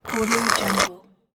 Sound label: slurping through straw